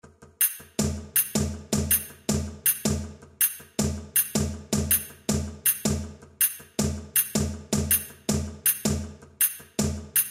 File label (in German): ZWEI CAJONES groove; stueck fuer 2 cajones